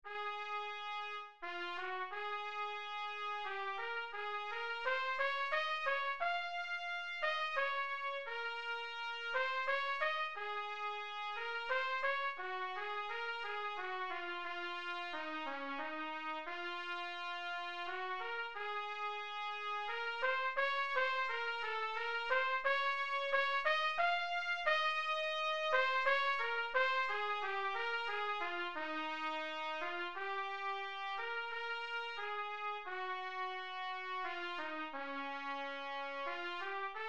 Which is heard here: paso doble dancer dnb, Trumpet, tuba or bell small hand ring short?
Trumpet